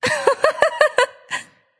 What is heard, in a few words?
laugh; smite; aphrodite